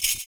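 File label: PERC, percussions; Univers Sons - Raricussions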